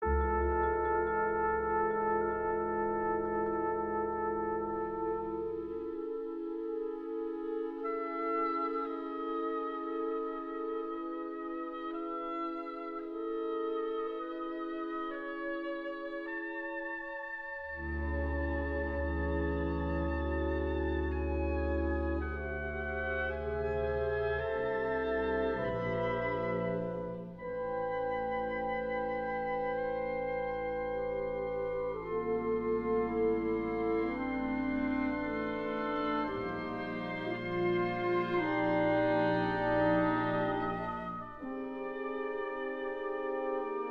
Concerto for Trumpet Wind Ensemble, Adagio; Concerto No 2

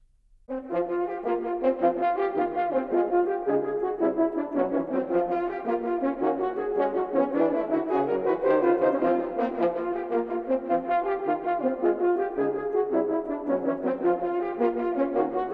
Quartet for Four Horns, Rondo

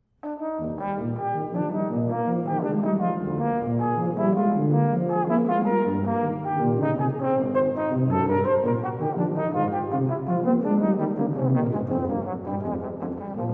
Suite for Tuba and Horn - Burlesca

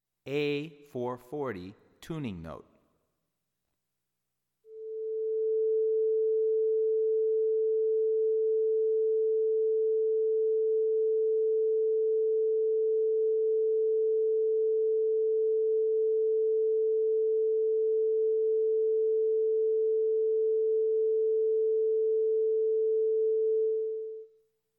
A-440 Tuning Note

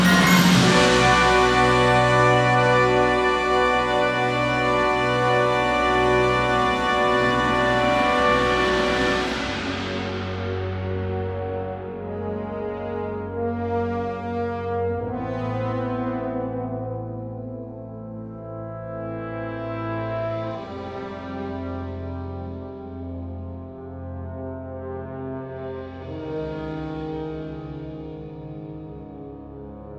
Wind Symphony

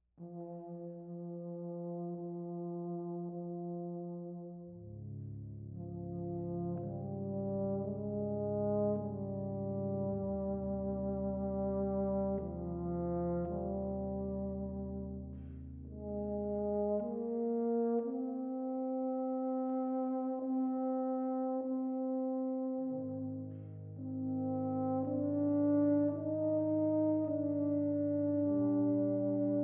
Symphony for Brass and Percussion - Mvt. 2. Lago

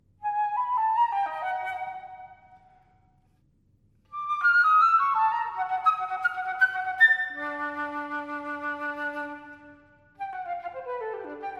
Pieces For Flute Alone, Finale

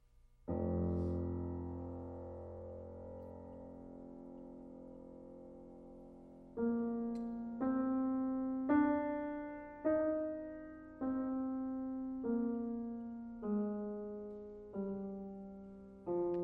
Clarinet and Piano - Lento

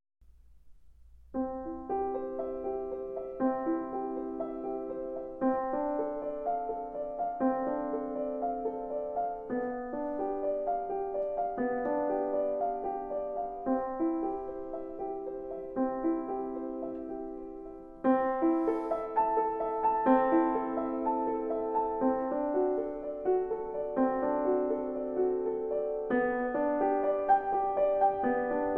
Prelude And Fugue In C Major; Keyboard Classics for Children; From Well Tempered Clavichord

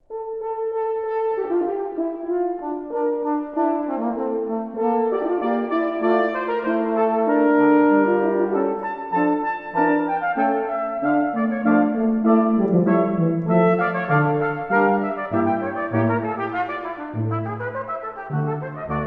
Fugue, Brass Quintet; Atlantic Brass Quintet

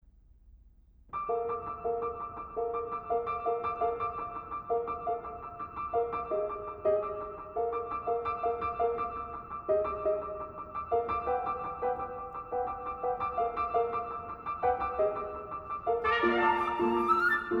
Trio for Trumpet Flute and Piano; 3. No Re morse

